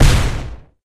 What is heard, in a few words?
Explosion; supercraft